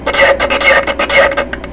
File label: eject